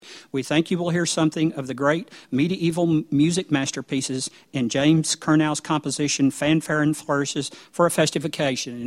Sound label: Fanfare and Flourishes; Preface; 2014 First Baptist Whitehall